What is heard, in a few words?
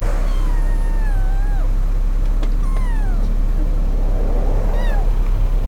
mew